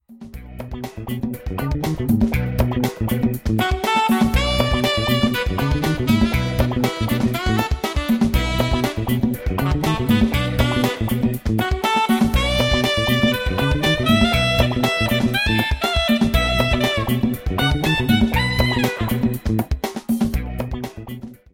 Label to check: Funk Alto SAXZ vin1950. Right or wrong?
Right